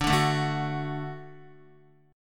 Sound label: D-Major, D, Guitar, 4F, 4A, 5C, 5G, 5C, 6E; x,5,2,2,2,2; strummed; JGuitar